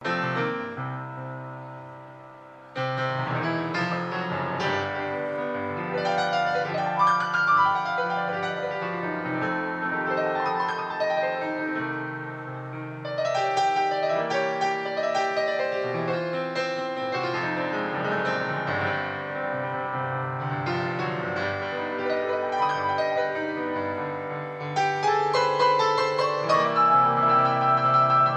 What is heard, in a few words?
Marco Solo